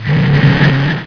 alert, zombie; mistake coop

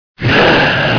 attack, zombie; mistake coop

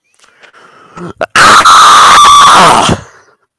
cow jump attack; svencoop; cow tycoon; gonome replace

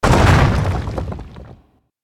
stone stop; ragemap2019

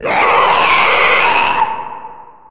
stalker scream; svencoop; echoes; dweller

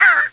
pain; sc toysoldiers; fgrunt

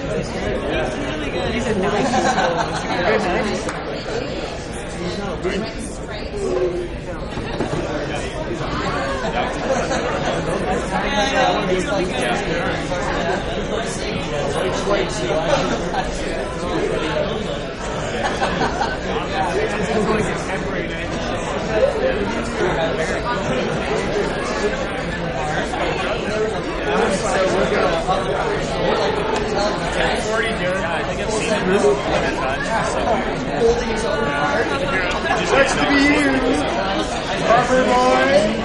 pub; svencoop; legends

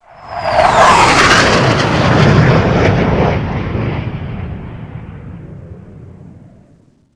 bomber wash; svencontra2; stage2

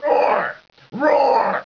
pain; svencoop; cracklife; controller

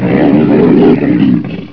alert, ogre; svencoop; legends

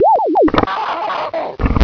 birth, gonarch; svencoop; cracklife

